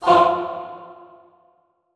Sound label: FAH F2 L, Extended Classical Choir; Best Service